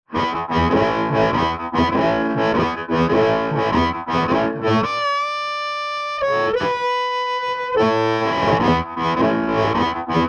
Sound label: Harp Tweed; TubeUlent